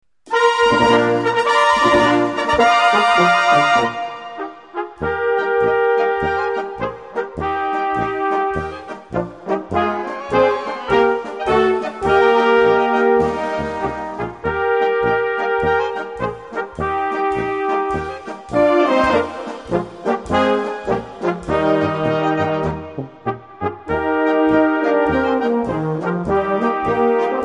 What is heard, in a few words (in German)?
Blasmusik